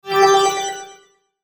Sweeper Fast Flange Twinkle, Sweeper Windy Tremolo; Production Element Imaging Element Accent Transition; Sweeper Sound Effects